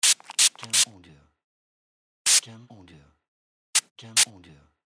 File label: Spray Sounds